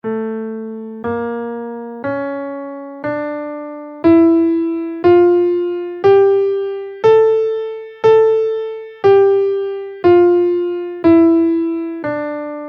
phrygian dominant scale in A

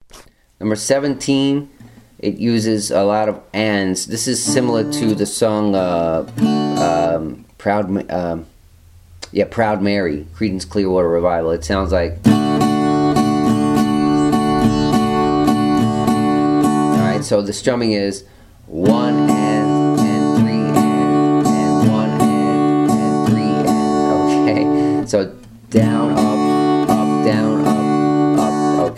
strumming

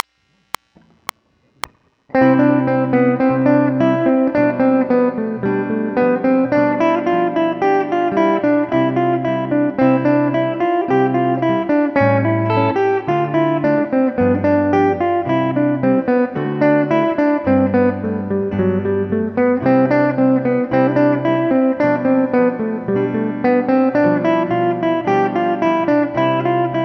moto perpetuo at 110 bpm

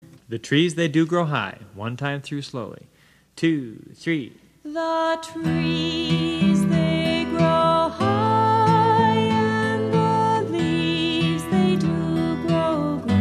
travis picking

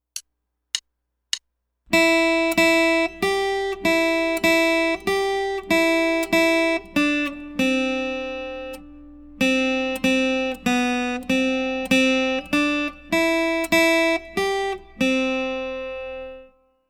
3 4 Study

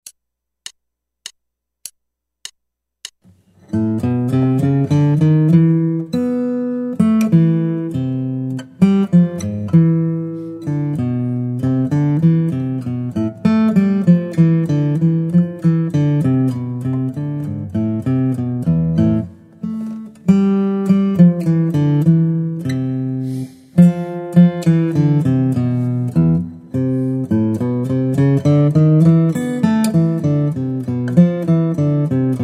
Guitar

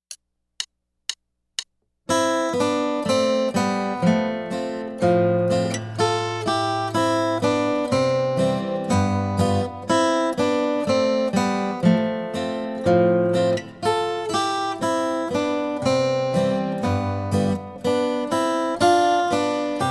Two Guitars

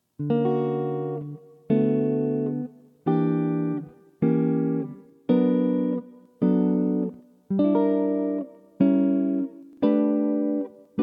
Maj 6th and Dim Chords borrowing, jazz chords; Barry Harris harmonization

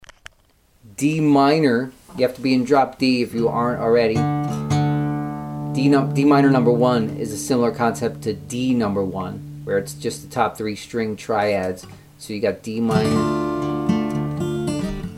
open string chord hybrids Dm

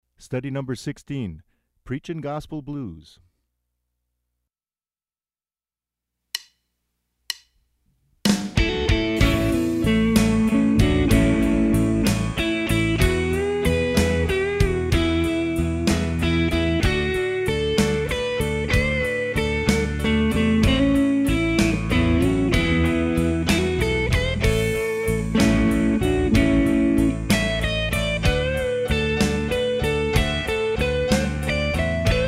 Gospel Blues

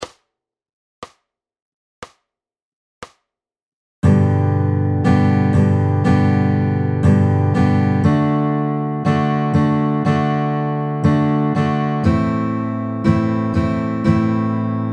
G D Am C G D C C 60 bpm

